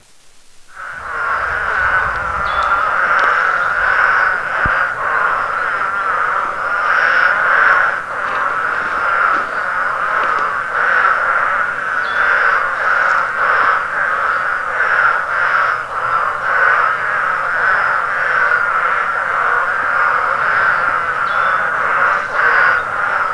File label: spadefoot, frogs